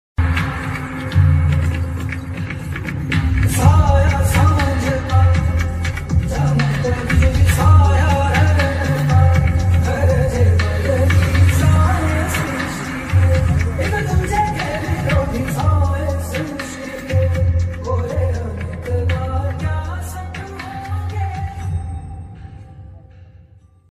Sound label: bgm ringtone, tamil bgm; ponniyin selvan; karthi